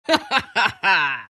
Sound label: laugh; Legion Commander